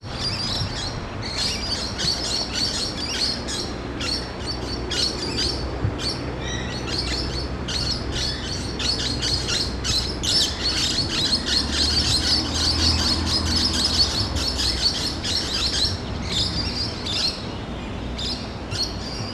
lake around from bridge; Coburg Lake; Coburg Lake 2026

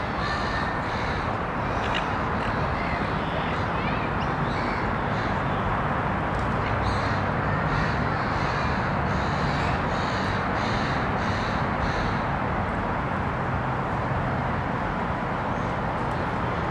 lake bbq; Coburg Lake; Coburg Lake 2026

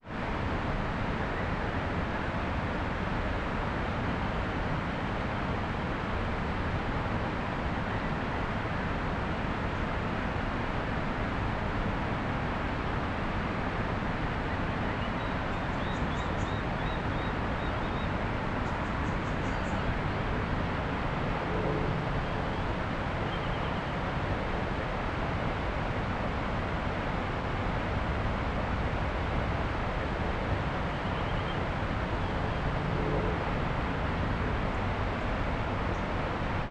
fish ladder bridge; Dight's Falls; Dight's Falls Tour 2026

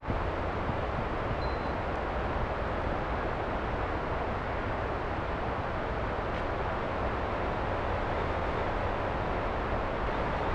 flat bit near mill, Dight's Falls; Dight's Falls Tour 2026

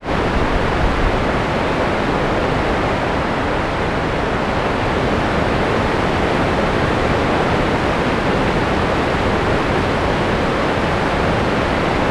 on top of falls; Dight's Falls; Dight's Falls Tour 2026

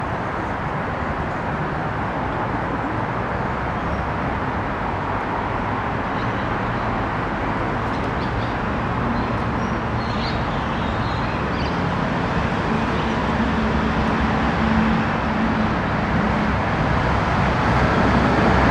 lake pentridge entrance; Coburg Lake; Coburg Lake 2026

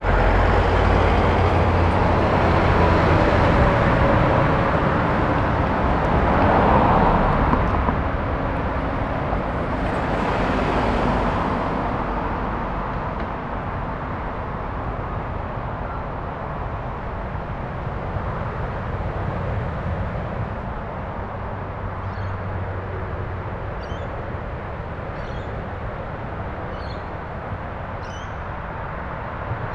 carpark, Falls Tour; Dight's Falls; Dight's Falls Tour 2026

